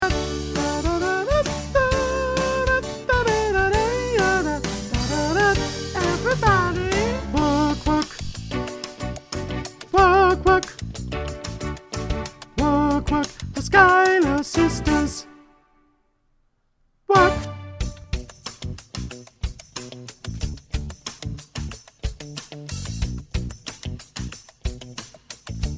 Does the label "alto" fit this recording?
Yes